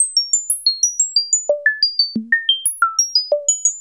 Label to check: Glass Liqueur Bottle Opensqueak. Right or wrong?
Wrong